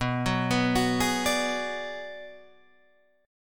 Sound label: B Minor 6th B Guitar Drop D, chord